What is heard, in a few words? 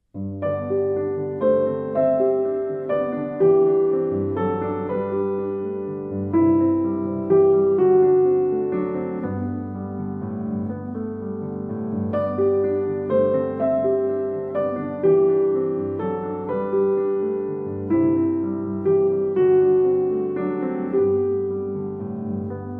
Solo Piano